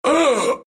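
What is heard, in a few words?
pain, Russian; Dota 2; Wraith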